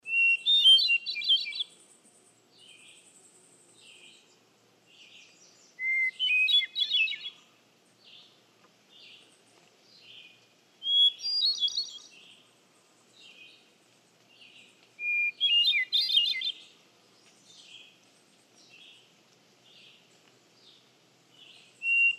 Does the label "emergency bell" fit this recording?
No